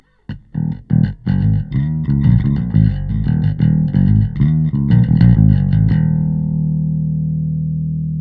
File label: Bass; RootOne